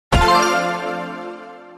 click; phone; fortune

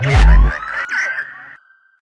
Start

